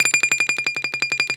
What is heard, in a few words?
BALALAIKA, Russian Strings